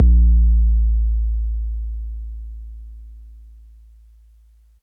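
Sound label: bass drum 808 MPC60 Noise A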